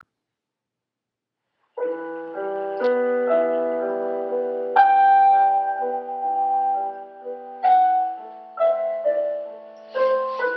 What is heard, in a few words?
Piano recording